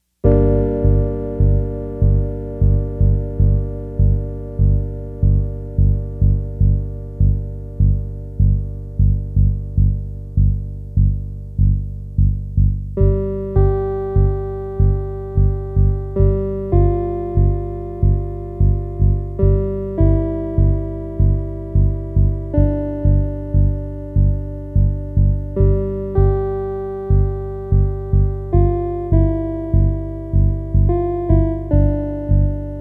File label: univox cp 110 electric piano; dr zee workshop